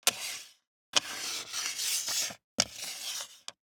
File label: DICE on Plastic, Slide, Standard, 2 Two Dice; Samples; ArticulatedSounds; DICE Sfx Party (Extended)